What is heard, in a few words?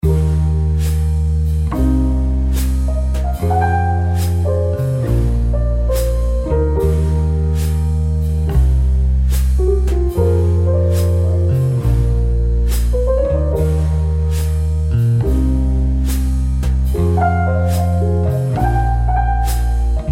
Coffee Shop Jazz Relax Rainy Day Jazz